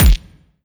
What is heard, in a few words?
kill sound